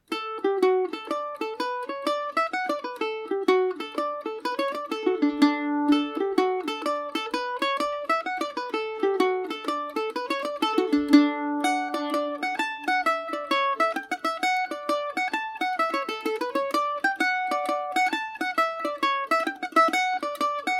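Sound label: D major, Irish mandolin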